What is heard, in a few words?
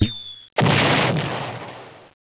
Special Bomb; mahjongjinghai